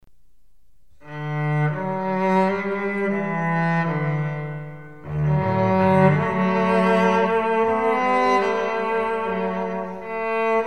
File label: Cello